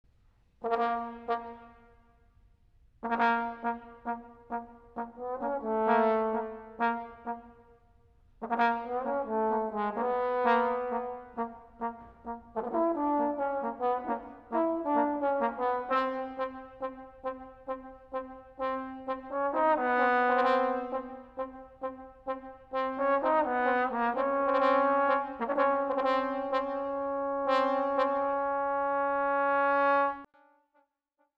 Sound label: fanfare bones